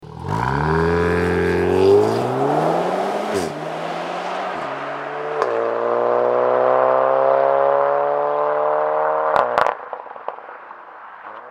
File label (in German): Losfahren R Variant vor OPF, Catback-System Golf VII R Variant; Ohne OPF - Losfahren; cupra r st 5f; Abgasanlage